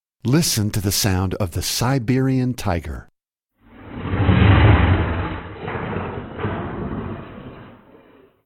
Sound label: Siberian Tiger Sound